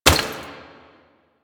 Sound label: Step Dirt, Footsteps